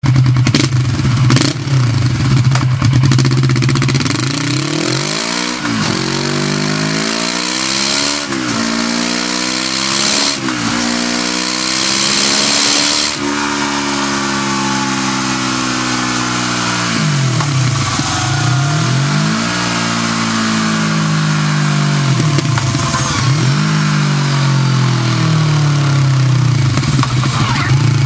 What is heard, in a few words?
KAWASAKI VERSYS 650